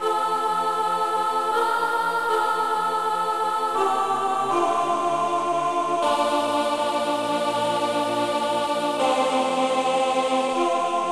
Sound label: Jesus Keep Me Near the Cross Soprano, choir